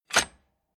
Rattling Old Metal Movements Mechanical Creeking Rickety Short Single